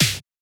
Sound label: clap snare; Anthemic